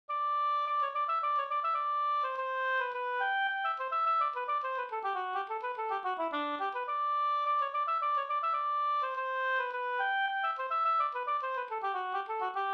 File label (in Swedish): polska, Gdur; Byss-Kalle